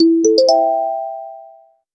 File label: a two note chime cheerful